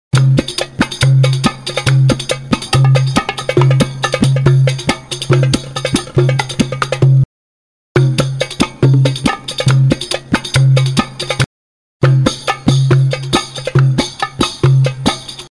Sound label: oriental percussion; music elements; grooves